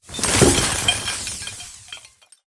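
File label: Battery Crate Explosion Debris Sizzle; Titanfall 2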